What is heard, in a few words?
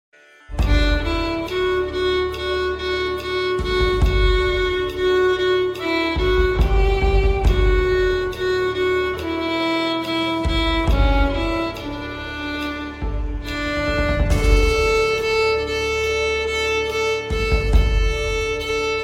National anthem of India on violin